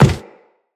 Walk Wood, Footsteps; Operator

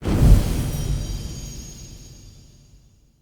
Rep Token Pickup Fey

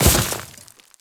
Step Veg, Footsteps; Enemies; Warframe; Grineer

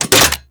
Rocket Launcher Reload

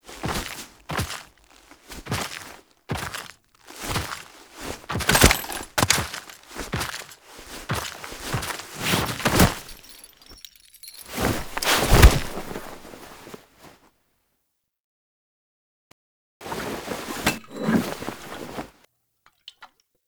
Foley; Warframe; The New War; Cinematics; Grineer Eidolon Ending

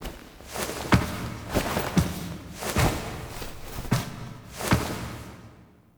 Foley; Cinematics; Warframe; The New War; Lotus Gem; Drifter

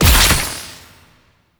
Damage Zone Place; Gameplay; Warframe; Nineteeen Ninety Nine; PvPvE

